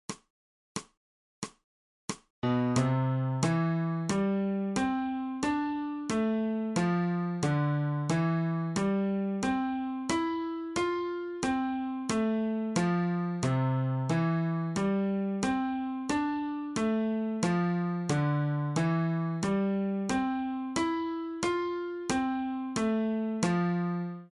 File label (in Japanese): 新フレットレス